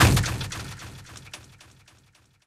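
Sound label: ricochet hit modules, tanks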